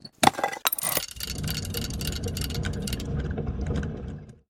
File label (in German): Knopf Mechanismus; Kurbel, die alten Zahnräder; Hierbei wird es beabsichtigt das Alter der Mechanik darzustellen. Beide Bestandteile sind aus Metall.